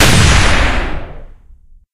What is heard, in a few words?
sniper railgun single, weapons; tf